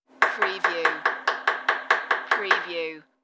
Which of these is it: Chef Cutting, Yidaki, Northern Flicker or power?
Chef Cutting